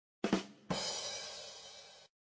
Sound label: badumts; tf2